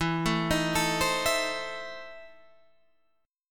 E Major 7th Suspended 4th Sharp 5th, Guitar, Half Step Down; EM7sus4#5